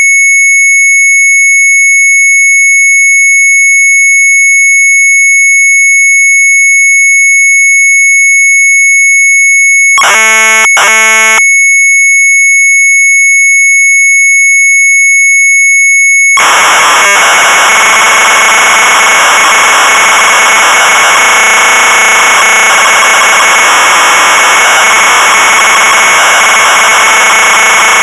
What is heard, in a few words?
MZ800 Tape, sharp; STUNTVOGEL; retromelsarchive